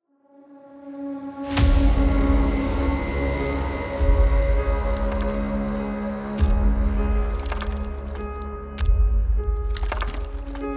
horror trailer epic atmospheric hybrid horror music